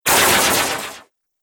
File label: Science Fiction Laser Glass Shards